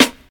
Noisy Acoustic Snare C Key, acoustic snare sample tuned to the C note